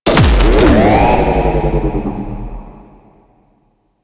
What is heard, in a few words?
Console Stalled; Ambience; Warframe; Infested; Golem Trial